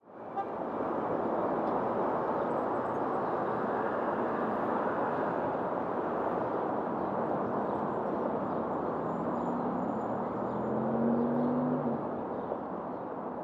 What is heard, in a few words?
Urban Ambience; Animal Crossing Happy Home Designer